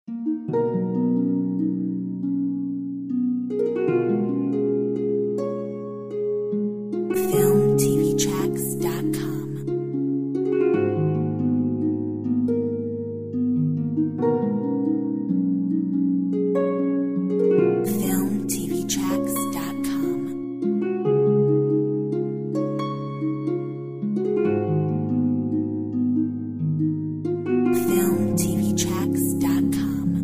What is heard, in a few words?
gentle rain Harp